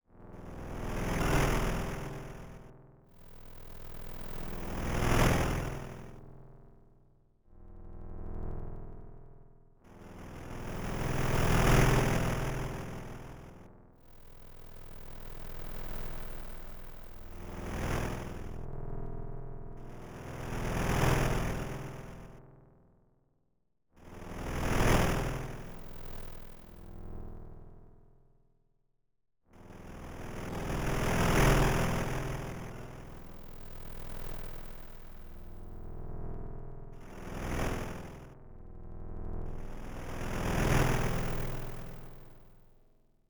Crowd; pc-9801

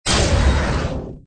Dragon; Conquer Online 2.0

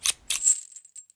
Scissors

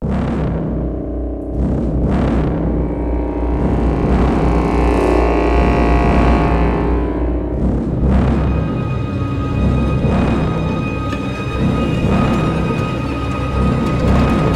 Suspense Stinger; rise of nightmares xbox 360 gamerip 2011

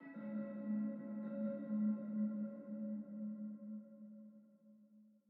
Env Field Day Cave; the legend of zelda tears of the kingdom

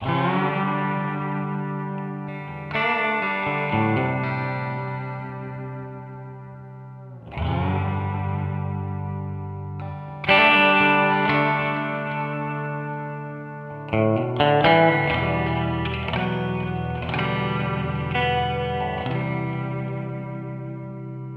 fail; Remington Great American Bird Hunt; wii

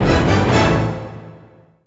SFX Excalibur hit; Zen Pinball 3D; 3DS

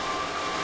Pilon Servo Big Loop

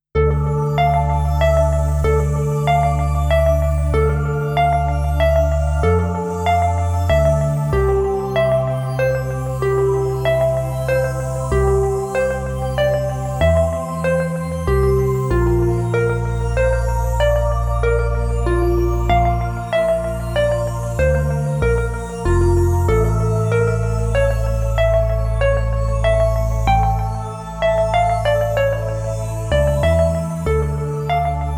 game over; Black Jack Hi no Tori Hen

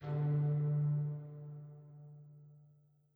chime old; chime sharp

